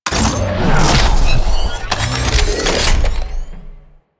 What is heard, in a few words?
Heavy Weapon Summon; Arch Dual Pistols; Dual Ducarion; Warframe; Archwing